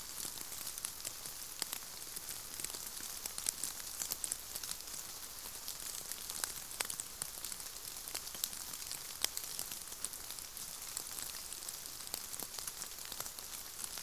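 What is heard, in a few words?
Rain light moderate consistent leaves; boom library; Thunder And Rain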